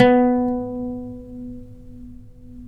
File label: OUD; Roland - LA Composer vol. 3